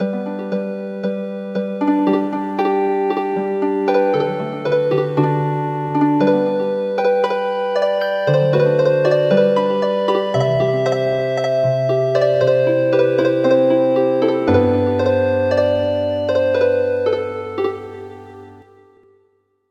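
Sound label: Healing Harp, traditional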